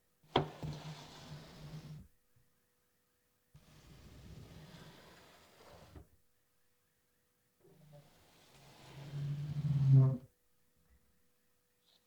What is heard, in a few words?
Chair On Wood Slow